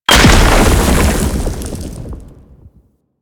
Octopede Rock Impact; Warframe; Man In The Wall

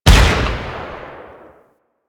Cannon Battery Fire; Warframe; Railjack

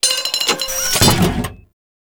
loot locker open; Orokin; Warframe; Tau

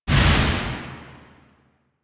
Scythe Proj; DE; Warframe; Weapons; Archwing; Melee; Exalted Archwing Scythe

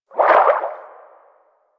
Fish Tank Movement Quick; Warframe; Liset; Hub; Personal Quarters

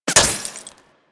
Laser Impact Glass Large

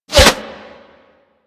Bullet Default Hit; Warframe

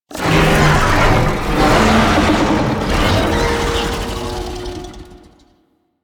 Tank Infested Vocal Idle; Enemies; Barks; Warframe; Lasrian

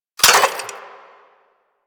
Fire Sweetner, Weapons; Warframe; Gorgon

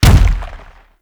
Biped Step; Warframe; Man In The Wall

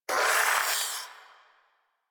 Shadow Vocal Grunt; Warframe; Duviri

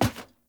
Heavy Grunt Boot Walk Wood, footsteps; Characters; Enemy; Sinecure

